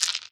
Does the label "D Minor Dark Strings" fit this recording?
No